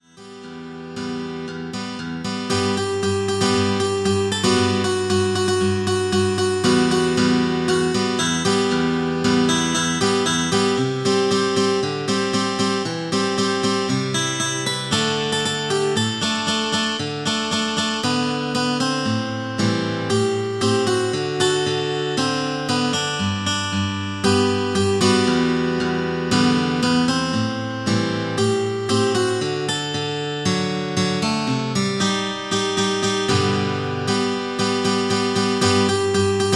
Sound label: Passager synth